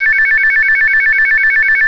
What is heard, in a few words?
phone